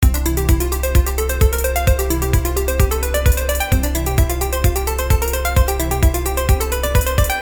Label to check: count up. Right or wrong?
Right